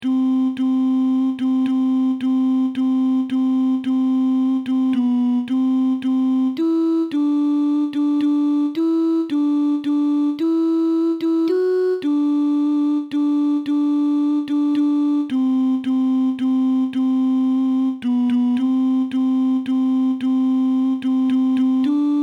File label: Alto; We Gather Together